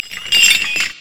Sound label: brazier chains